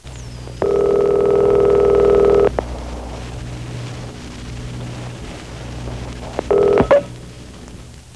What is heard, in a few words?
PHONE, 电话; 特酷声音素材库